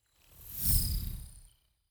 fly by insect drone; swooshes-swishes

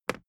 briefcase plastic handle bounce; industrie wirtschaft; bueroarbeit

